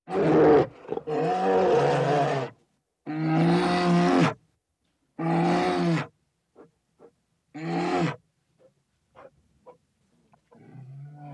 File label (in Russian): Zvuki lesnyh zhivotnyh - Medved